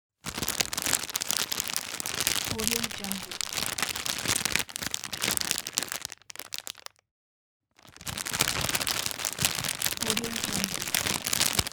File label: crunchy plastic bag